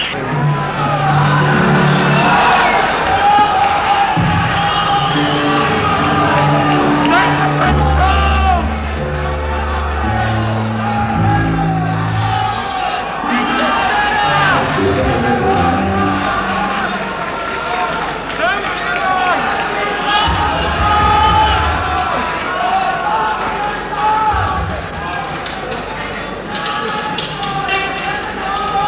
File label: Tuning & Soundcheck